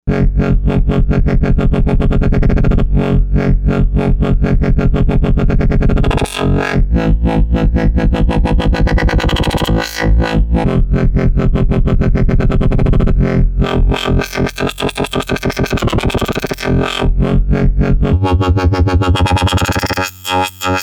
Wobbling, Novation UltraNova; Factory Patches

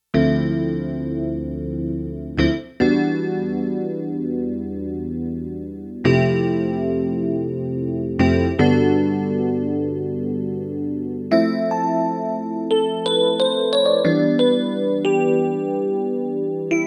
E PIANO, Roland JX-1; Factory presets

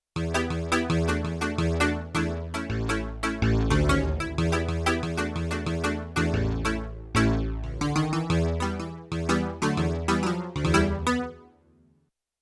Damp Clavinet, Yamaha TX16W; Spacey Keys

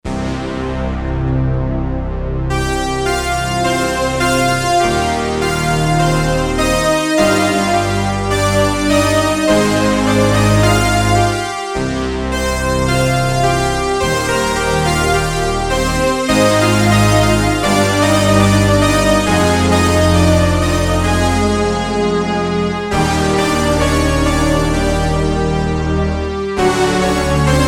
Octomoog

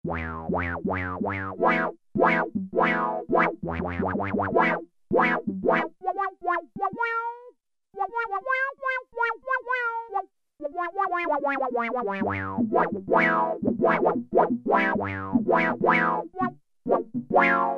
FUNKY KEYS, Oberheim OB-8; Factory Patches

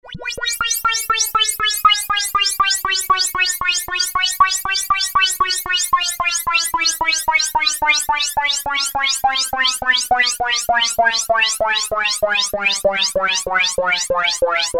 ALIEN CRAFT, Oberheim OB-8; Factory Patches

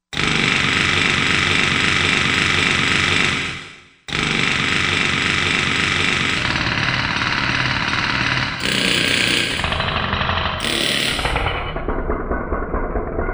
Hammer C6; Korg MSC-16S Environment

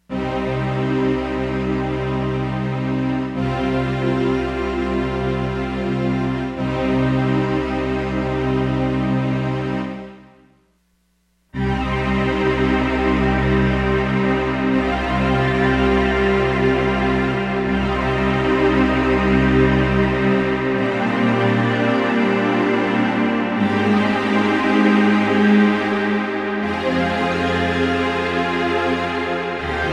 CHORUS STRINGS; CHORUSTRINGS

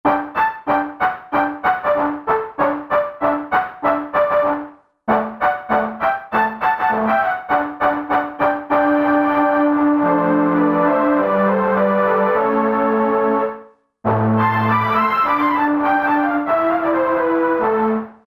Lo-Fi Strings; Roland SC-880; Factory Patches

